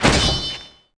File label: sword